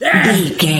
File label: voice male battle shout short